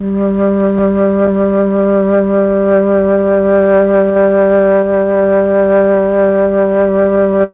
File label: flute; ILIO; Propeller Island Legendary M400